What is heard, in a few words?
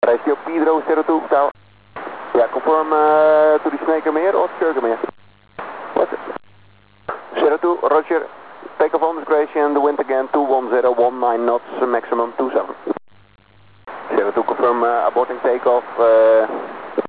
aborting takeoff; rescuepedro02; because the missing person had been found